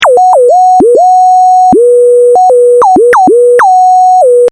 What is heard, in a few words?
fm synths